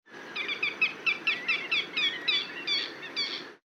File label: moorhen